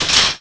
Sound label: Climbing Fence; DS; NEWSMB